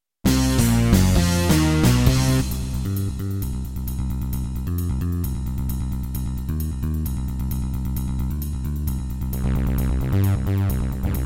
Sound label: Miditemp DoX-1; sigil doom episode 5